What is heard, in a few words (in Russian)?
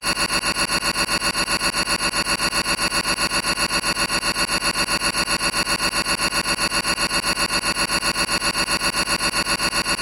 signal trevogi